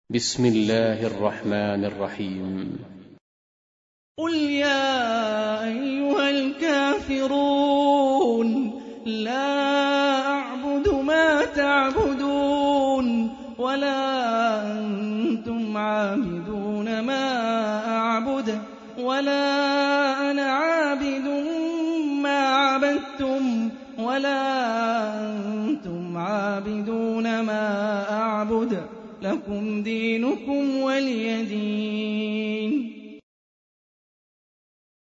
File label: Quran; Hani Refaey